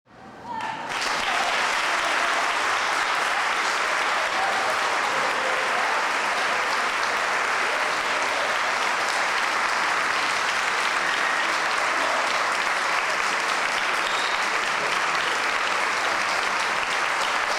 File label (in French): playback; chanson